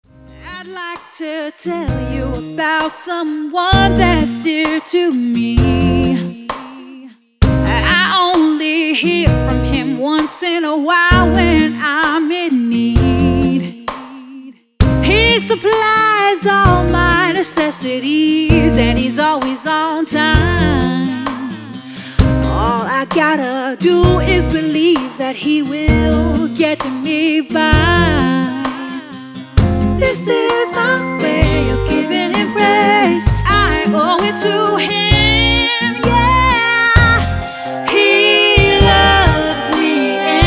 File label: Gospel Singer